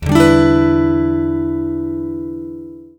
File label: Em7